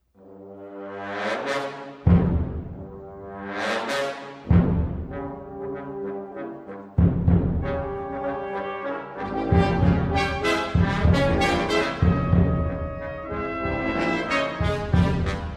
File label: full brass; Fafner; Little Monster Music